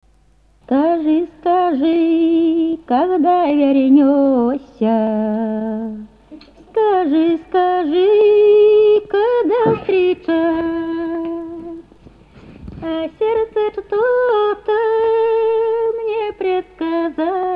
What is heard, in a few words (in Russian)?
Русское Поозерье. Свадьба. Понеделочные (отводинские) и необрядовые песни; Фольклорный фестиваль имени Ольги Сергеевой